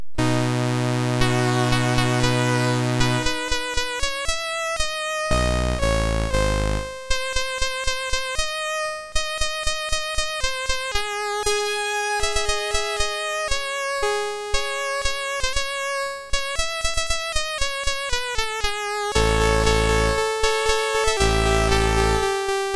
Recitative and Duet, MOS6581; Mabel and Fred; MOS6581R3; Pirates of Penzance

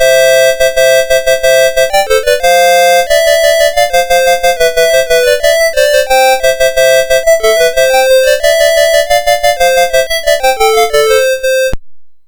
Jingle Bells MOS6581R2

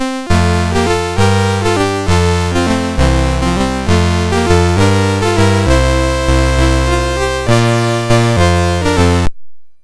Harmony, MOS6581R2

